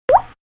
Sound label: Babys